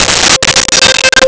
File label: Gtr Harmonic C5; Nintendo; July 2020 Gigaleak